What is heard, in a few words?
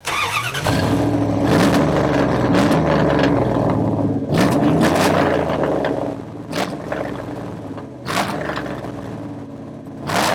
V8 Magnum sound